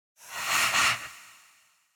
Breath - Demon - Triple Thin Startling, Echo; Hollywood Edge; sound designer's toolkit v2

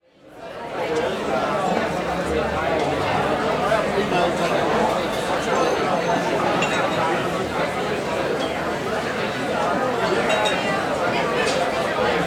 Bar Pub Busy Crowded Bar, Walla; Sound Ideas; Walla Series

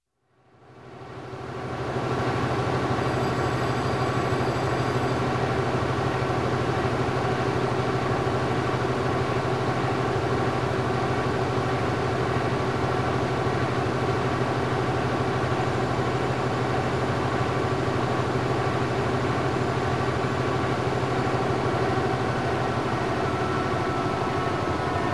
Newspaper Press Running, Slows Down, Stops; Sound Ideas 2000 Series

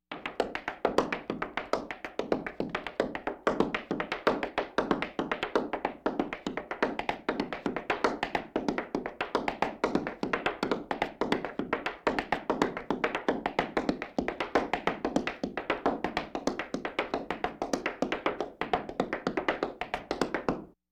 Laugh tracks

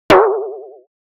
Metal Hit Pulsating Hit Very Fast Pulsating; Hollywood Edge; sound designer's toolkit v2

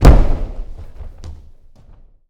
Boxing Ring Bodyfall

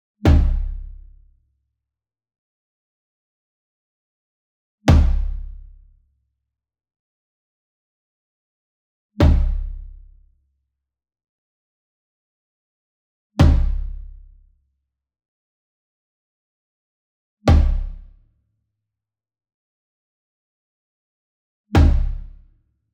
SINGLE DRUM Tom Concert Hit Soft Beater Near; boom library; Cinematic Strikes Construction Kit

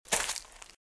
Footsteps Leaves Walk Left; RadwinIsland

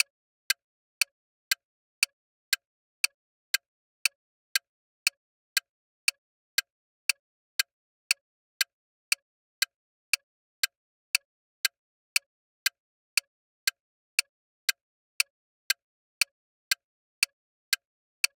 Bomb Timer; Clocks, Watches and Timers; Blastwave

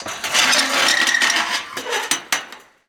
Gate Metal Fence Open; Doors

